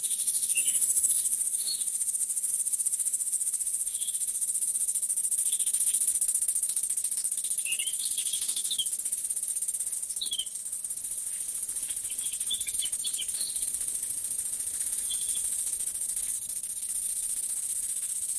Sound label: ambience summer